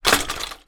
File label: transport bicycle impact crash hit fall over